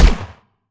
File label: combine smg lfe; weapons; Half Life Alyx